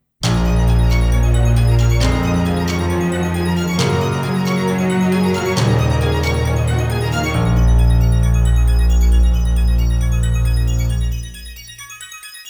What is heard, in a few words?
Victory!; Final Boss